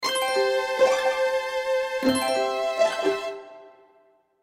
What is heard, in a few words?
Cartoon Melody Strings Twinkle; Cartoon Sound Design; Blastwave